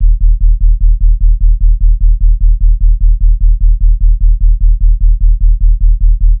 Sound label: Sub Loop D#; Ghosthack